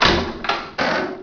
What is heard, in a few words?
DOOR; Hidden & Dangerous; h&d demo